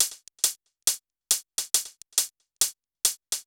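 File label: WALKING hi-hat, Techno 138 BPM; Best Service ProSamples vol.54; AKAI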